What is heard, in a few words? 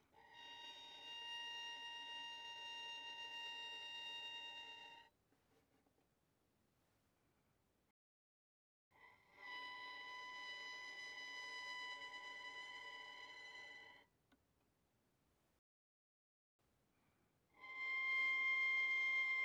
Violin arco pp sul D Bb5 Db6